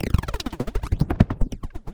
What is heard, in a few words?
loop, techno atmos